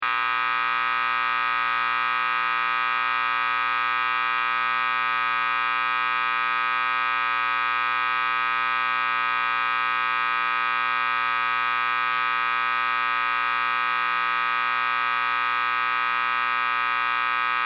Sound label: Amp Static, Electric buzz, humm, constant, static electricity noise from a guitar; LYN095 package of Noise & Static